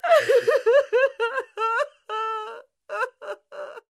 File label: crying, Human Female; Voice